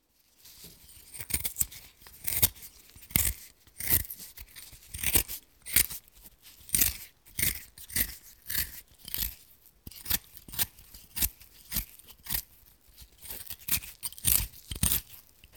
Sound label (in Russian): Morkovku chistyat